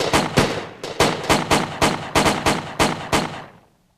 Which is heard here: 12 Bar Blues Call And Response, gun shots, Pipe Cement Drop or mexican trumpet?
gun shots